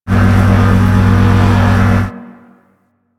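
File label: Cannon Pod Shell Door Slide Close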